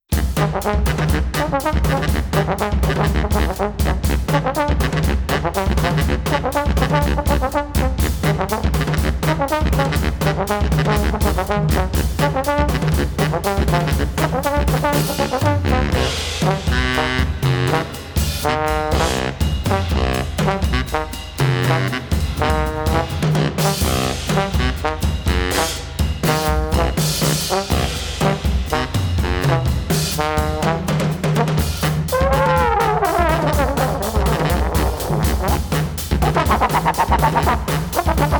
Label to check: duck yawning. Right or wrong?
Wrong